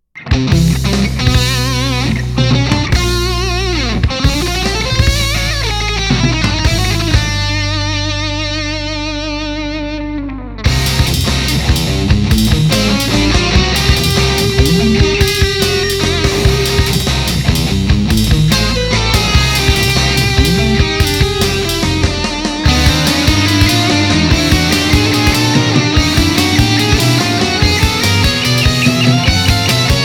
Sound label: jazz